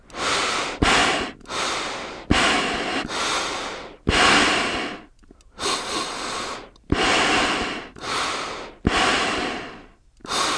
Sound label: deep breaths; five nights at freddy s fnaf 2